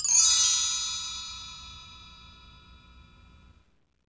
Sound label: PRC BELL